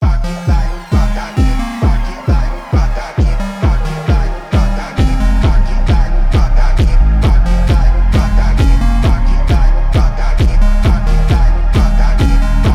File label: phonk mix ultrafunk